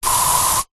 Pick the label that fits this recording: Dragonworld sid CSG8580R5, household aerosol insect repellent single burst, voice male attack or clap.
household aerosol insect repellent single burst